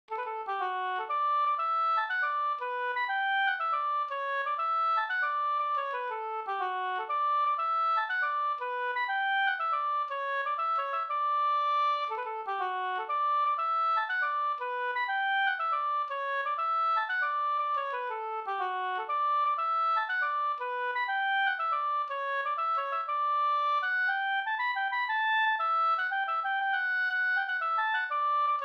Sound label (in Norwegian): pols Rorospols; Rodojen no